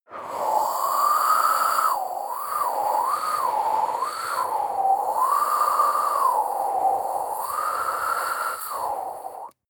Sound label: breath female eerie wind